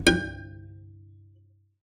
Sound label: Cello D Bass